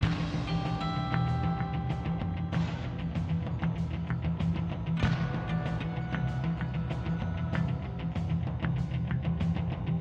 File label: BGM Loading Loop; Gun Bros 2